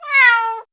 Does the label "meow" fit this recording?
Yes